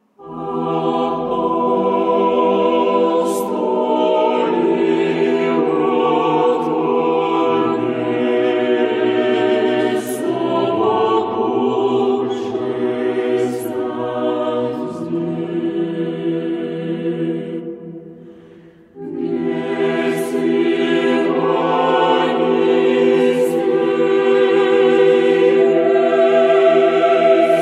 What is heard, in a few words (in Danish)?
ortodokse domkirkes kor i en mariahymne; Vilnius